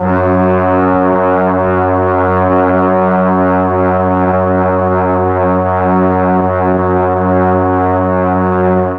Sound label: F#2 BRAS, Mellotron; Mike Pinder - Mellotron Archive CD